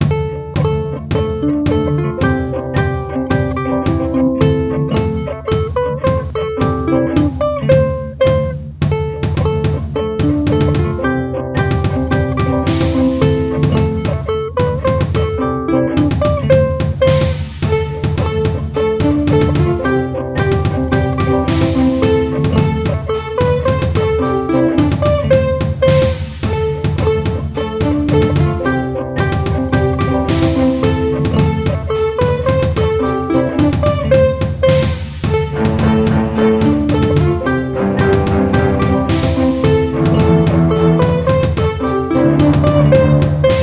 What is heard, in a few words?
ukulele; drunken sailor with a ukulele; weeklybeats-2014